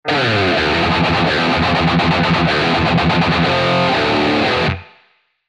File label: guitar sound